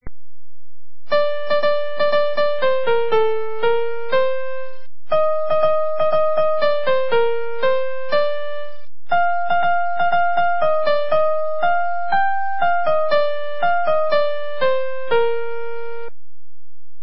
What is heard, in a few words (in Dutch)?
liedje piano